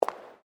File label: FOOTSTEPS WOMENS HIGH HEELS CONCRETE STEP